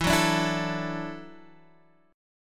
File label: E 7th Suspended 2nd Suspended 4th, down, Guitar, Drop D